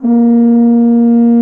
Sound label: A#3 TUBA